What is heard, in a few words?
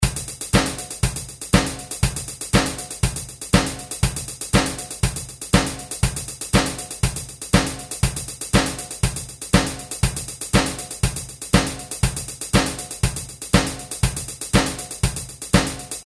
dance, 120 bpm